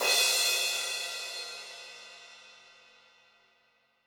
crash sound d sharp key; Cymbal drum one shot; drum sample; single hit

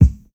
studio bass drum c key

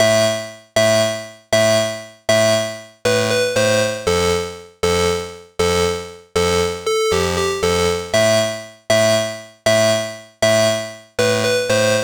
key lead 118 bpm; loops; sc essentials

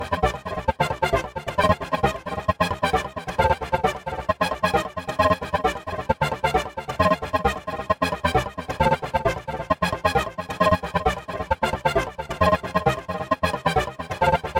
techno lead swing groove gated cm; instruments; loops

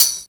airy sizzle hat one shot f sharp; airy sizzle hat one shot f sharp key; hat samples

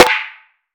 long snare sample b key; snare drum samples

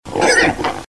pig squeals

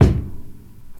long room reverb kick sample E key; kick drum samples

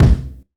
low bass drum single hit d key

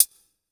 small reverb high hat sound D key; hat samples

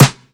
Clean Steel Snare Drum D Key; Duration 0:01; Channels Mono; snare drum samples

